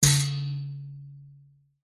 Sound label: foley metal spring med ping flick hit tight; zapsplat